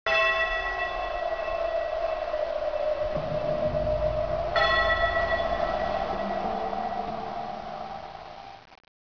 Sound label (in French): cloche vent